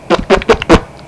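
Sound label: SPITTING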